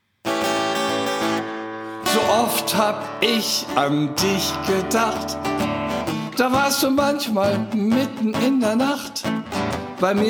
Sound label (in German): Kuschellied